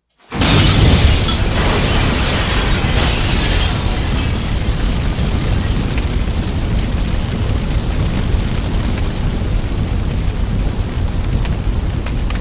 vzryv i gorenie padaet zerkalo

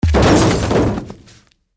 udar po kolenjam chelovek vletaet v lobovoe steklo

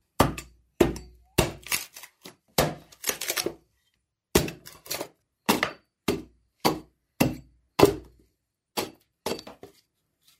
otkalyvaut chepki lychiny; Делают щепки; топор; В лесу звук топора по дереву

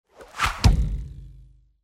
vrashhenie i vonzanie s gulkim zvukom; Звук ножа; Звук метания ножа

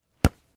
1 udar

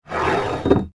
skolzit

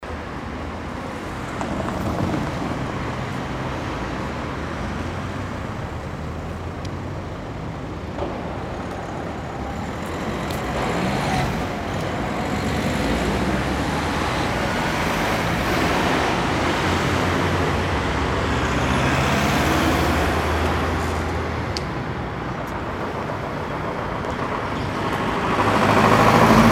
mashiny v gorode

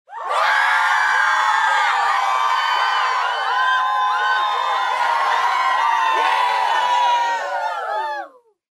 vizg

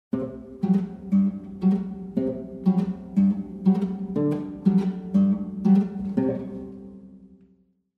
Ostorozhnue zvuki arfy

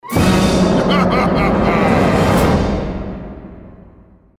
strashnyj smeh; Страшные звуки для монтажа

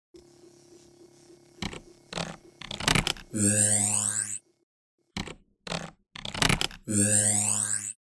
komichnyj glitch

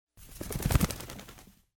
vzlet zvuk krylev krupno

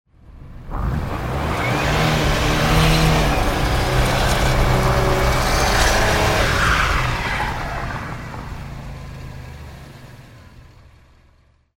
mashina buksuet v grjazi letjat oshmetki grjazi

